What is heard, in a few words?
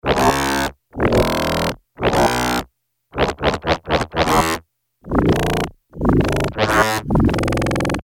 Yamaha DX7 WILD BOAR